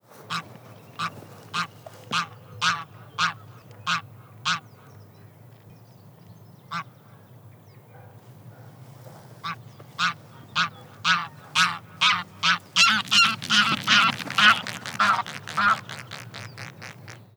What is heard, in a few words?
Anser anser